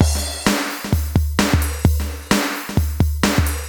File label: Alesis HR-16B (130BPM), beats